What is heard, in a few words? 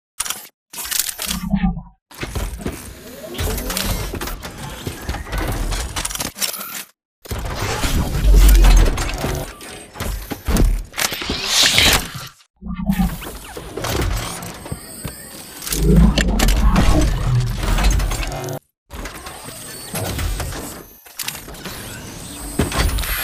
Combat Idle; Titanfall 2